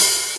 airy sizzle open hat a key, Royality free open hi hat sound tuned to the A note